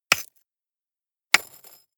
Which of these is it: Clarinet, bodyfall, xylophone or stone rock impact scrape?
stone rock impact scrape